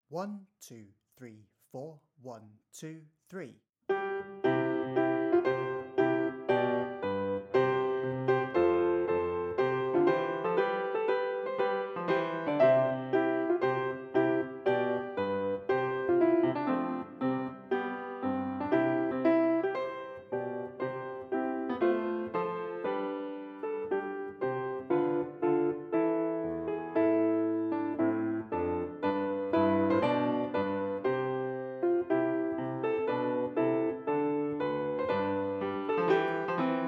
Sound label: minus1; Charpentier; Te Deum